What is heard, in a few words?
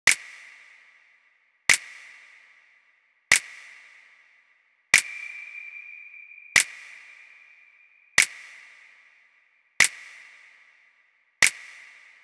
Drum Loop 148 BPM Snare; Loop Stems; Cymatics; DOPE DRUMS VOL. 1; Friday Drum Loop